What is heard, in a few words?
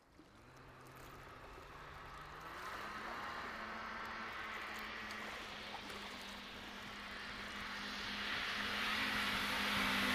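jet ski sound passes by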